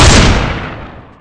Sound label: nova fire; weapons; covert